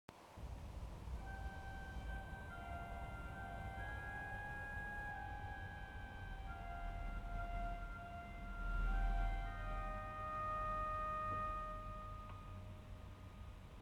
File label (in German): Gambenreihe 16 8 4 Gambette 4, Orgel